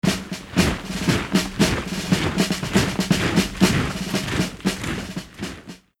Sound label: French legion-march